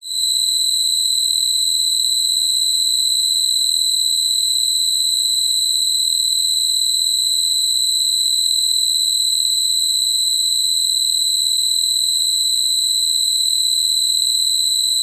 insects crickets chirp constant designed; animals

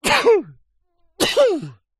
human male sneeze x2

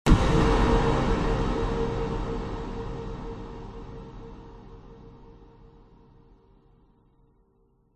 horror hit thud clunk atmos long reverb

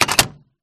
phone booth hang up

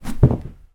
household rug corner lift drop

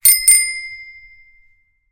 bicycle bell ring classic; transport

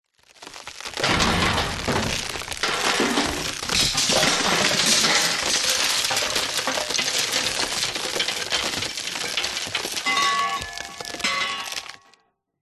impacts metal wood crash collapse drop stress; zapsplat

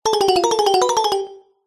cartoon footsteps playful fast scarper scurry

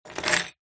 household keys bunch down table

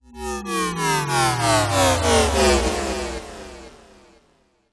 sound design fm descend delay

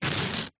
household baking grease proof paper rip

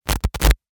Guitar Computer Jack Noise

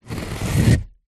foley knife serrated cut polystyrene

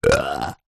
human male 37 years old burp

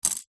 cutlery plastic knife set down table; household

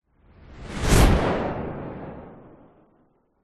fantasy magic whoosh dark reversed powerful

